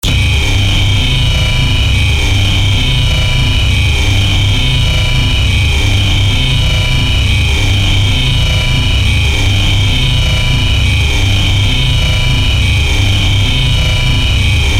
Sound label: Alarm